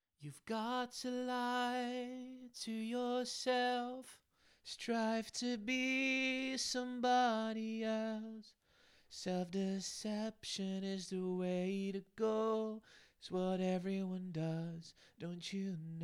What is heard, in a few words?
Indie Pop 110 GM